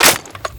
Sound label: famas reload clipin